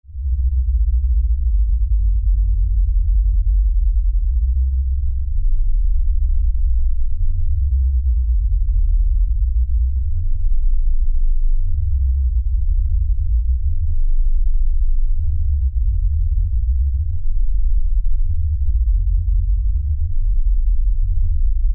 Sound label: Lfe Drone Low Bass Rumble; Blastwave